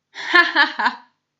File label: hahaha